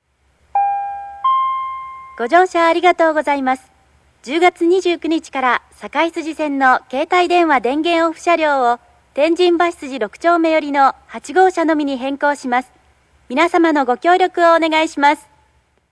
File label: poweroff